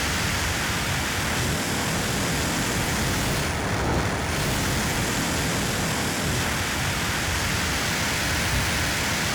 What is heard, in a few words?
tools blowtorch hit loop